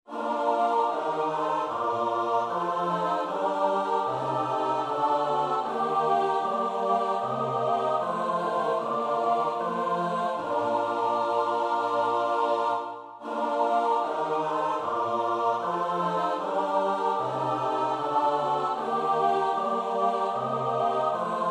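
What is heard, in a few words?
soprano alto tenor bass; Bach (Johann Sebastiaan); BWV 164; Ertöt uns durch dein Güte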